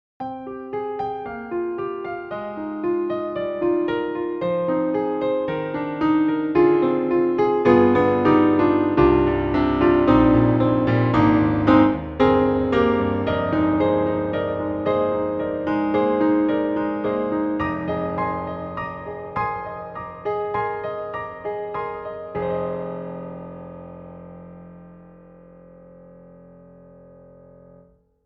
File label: Italian Grand, Chapel Grand; Synthogy; IvoryII